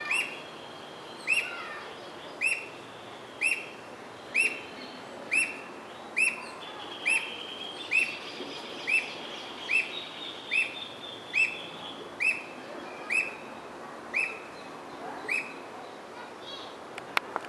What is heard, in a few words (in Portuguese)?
Som Alma de Gato